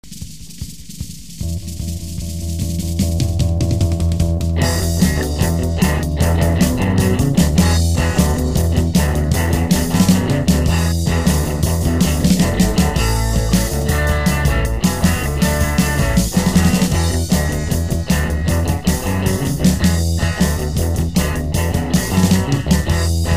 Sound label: instrumental; Leffan, Grottan Feb 2, 1979